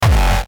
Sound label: kick; misskey